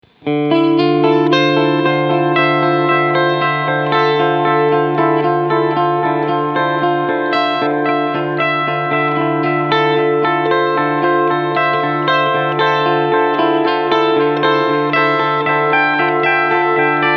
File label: Joyo Aquarius Analog Delay